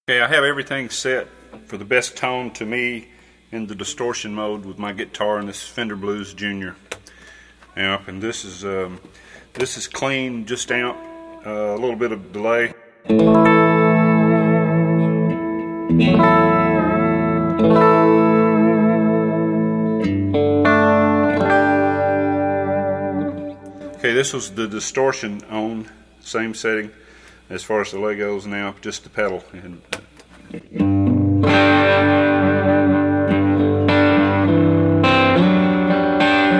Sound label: clean vs mild distortion